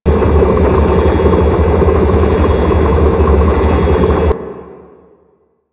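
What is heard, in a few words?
Gas Refinery Machine Loop; Ambience; Warframe; Corpus Gas City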